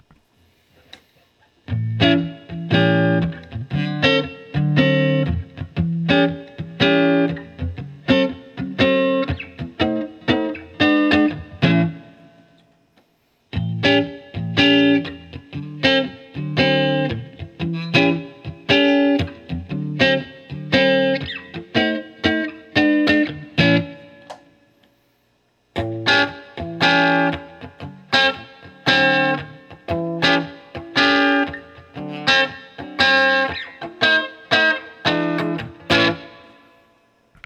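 Guild Starfire III P90 Minor Seventh Chords, guitar; 2002 guild starfire iii 90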